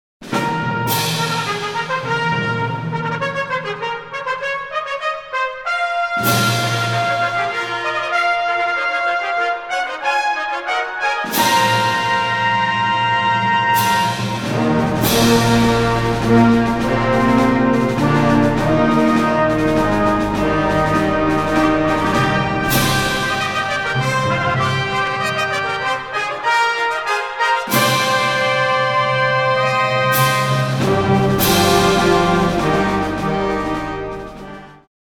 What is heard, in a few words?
Fanfare Prelude; O How Shall I Receive Thee